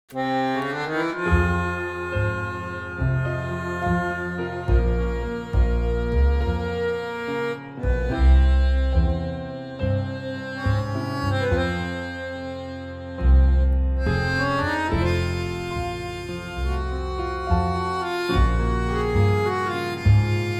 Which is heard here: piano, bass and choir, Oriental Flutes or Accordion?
Accordion